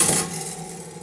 Prepared Piano; Battery 3 Sample Kits

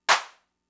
Clap; Fricke MFB-522

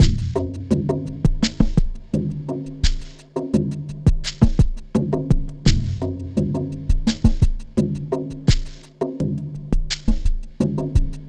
DJEMBE FRAME MACHINE COMBO; ELECTRO ACOUSTIC HYBRID GROOVE; Sonic Foundry (Sony Creative Software); Vir Unis Infusion

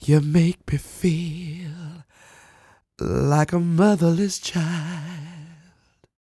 croon, Vocal Planet Blues Soul; Spectrasonics

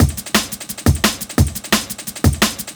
DNB BREAK

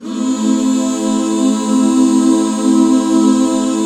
A MIN, Back Chords; Sound & Vision - Gigapack II CD 1

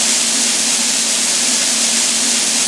A#3 DISTN, DRUM; Northstar; The Wizard VOL-1; DRUM INERTIA

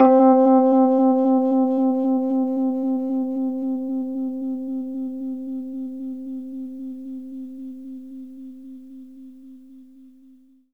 Rhodes fast C; 3D Sound; 3D Keys VOL-1

